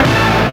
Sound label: GRUNGE; AMG - Vince Clarke Lucky Bastard